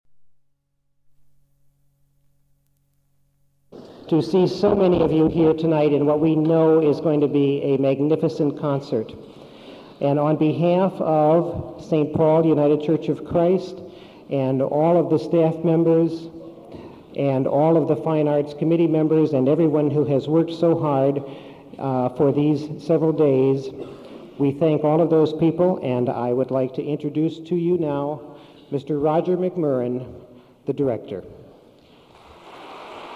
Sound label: symphonic choir and orchestra; kiev ukraine